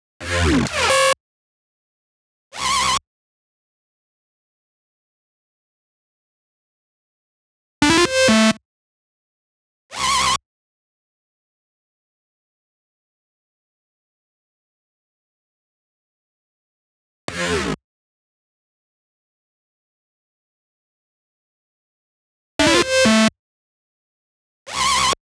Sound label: Drop Glitch Sounds